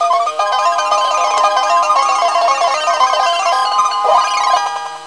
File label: intro music; charley cat jap cat